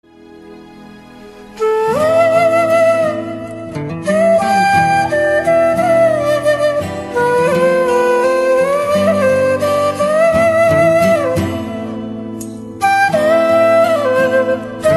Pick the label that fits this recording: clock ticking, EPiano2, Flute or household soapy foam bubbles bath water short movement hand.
Flute